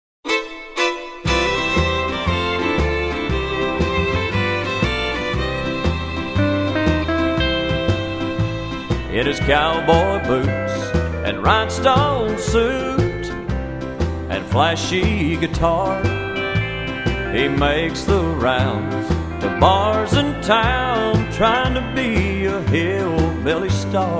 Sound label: Honky Tonk; Cowboy